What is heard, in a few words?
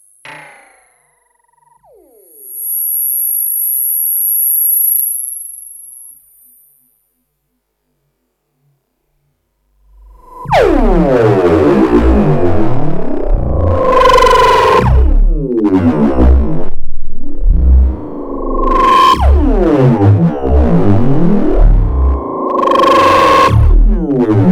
double pitch shift, Noise No input mixer